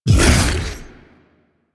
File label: Bowl Jump; DE; Warframe; Warframes; Grendel